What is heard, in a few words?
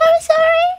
section fail; NM Sakamata Chloe